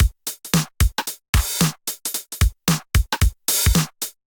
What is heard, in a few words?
707 Kit groove 112 bpm; MIDI Clips; 111 - 130 bpm; 707 Rok With-U Kit groove; Ableton; Factory Packs; Drum Essentials